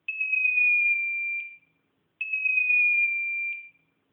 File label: nokia 1100 ringtone; rocket